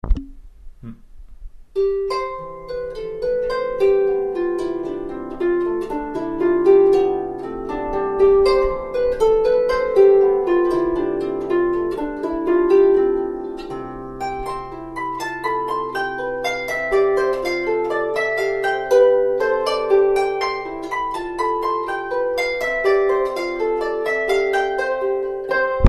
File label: harp